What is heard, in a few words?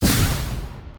Prismatic Laser